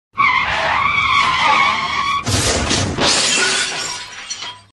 car accident sound effect

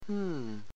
hmmm, hmm reaction sound effect

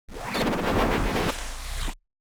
Fast Forward

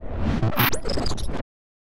Data Transfer, sound effect

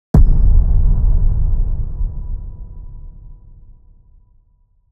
Grand Hit A

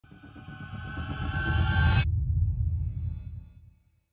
Riser

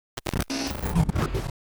Portal Hop, portal glitch sound effect

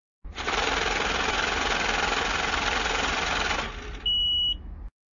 Racks

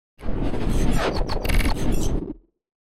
Disconnected

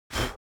person blows; air blows; mixkit

